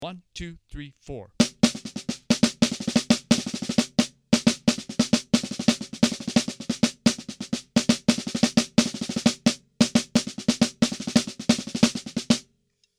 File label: Melody Snare